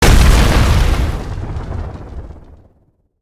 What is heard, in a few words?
Rock Throw Impact Swt FLAME; Evolve; Meteor Goliath